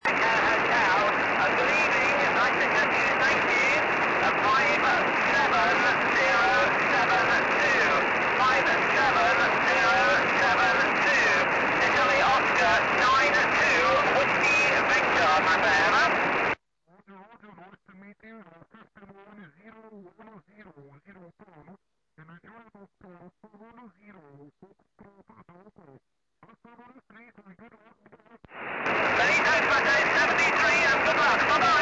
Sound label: 70cm, tropo